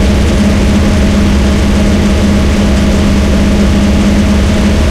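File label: panzer4 engine interior rpm load; War Thunder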